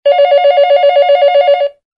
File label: workphone, sonneries